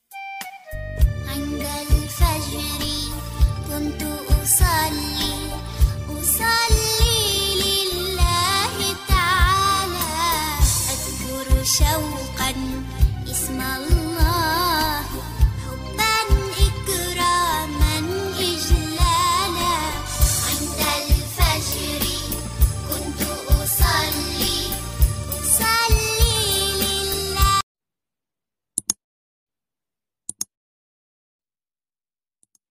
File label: Arabi naat ringtone, islamic ringtone